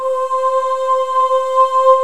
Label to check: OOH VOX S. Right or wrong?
Right